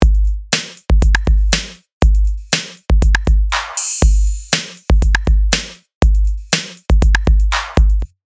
Drum, Drum mix without reverb, notice how flat this sounds; 3d mixing